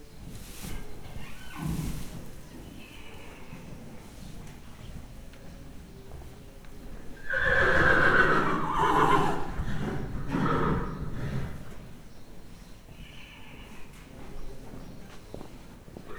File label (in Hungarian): nyerítenek lábával a palánkot rugdossa, ló; Hortobágyi Nemzeti Park 2013; máta